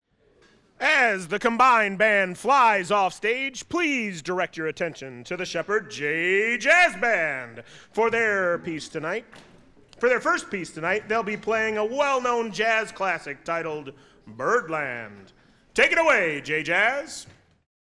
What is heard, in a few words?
Jazz Intro